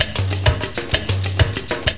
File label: perc loop; OctaMED SoundStudio V1